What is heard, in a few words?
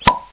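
bottle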